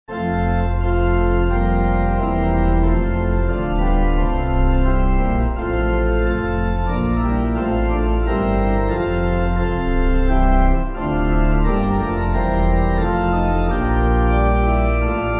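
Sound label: Pipe, Pipe Organ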